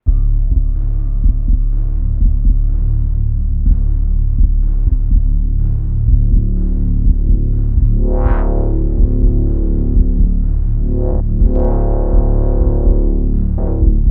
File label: Heartbeats; Subspectral